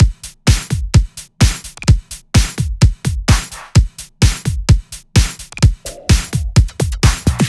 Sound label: 128BPM Dubwise Kit